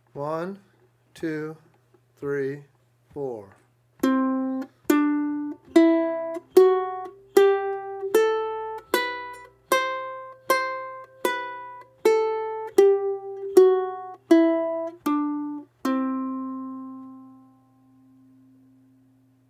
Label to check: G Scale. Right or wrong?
Right